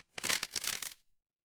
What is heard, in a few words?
paper fabric crumple